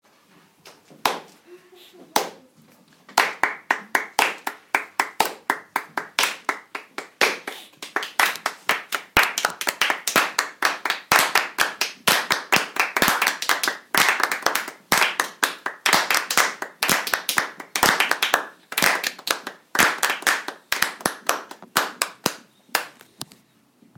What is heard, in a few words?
clapping piece